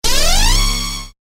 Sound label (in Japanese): 呪文を唱える モノラル; 魔法効果音